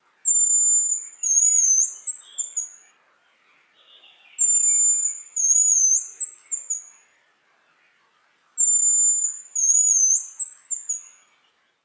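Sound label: hazel grouse voice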